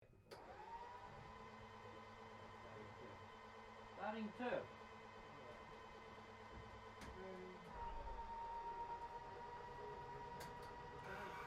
AIRCRAFT PBY CATALINA STARTUP INTERIOR, Catalina Flying Boat; 1942; Airplanes